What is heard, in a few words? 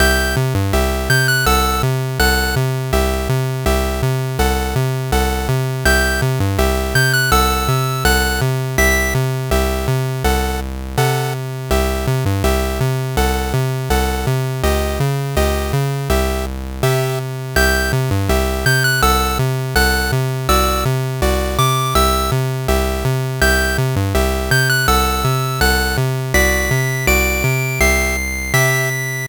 Mystery Music; Bebop and Tempo